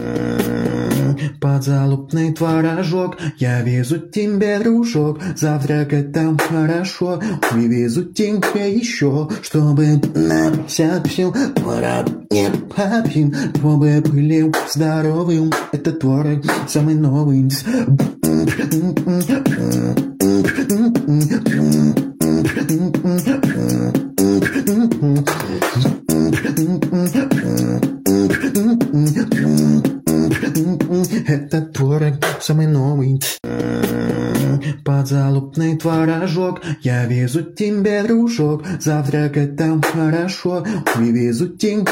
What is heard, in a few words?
beatbox